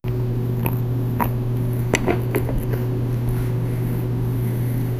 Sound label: eating sounds chair creak, night ambience